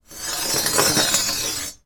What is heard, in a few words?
foley metal strip scrape on wood